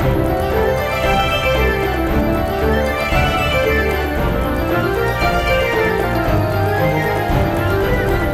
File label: big win theme; wingsofriches